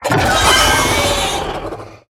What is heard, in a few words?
Felbat Shrieker Death